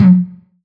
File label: 909 Tom amp